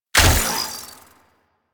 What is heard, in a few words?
Full Draw Shot, Weapons; Warframe; Infested; Derelict Cernos Prime